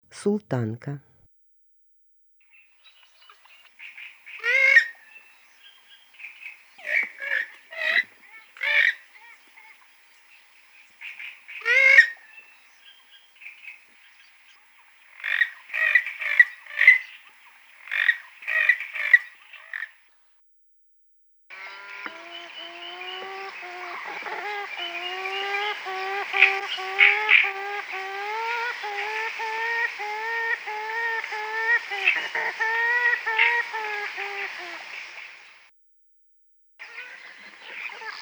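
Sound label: sultanka voice, purple swamphen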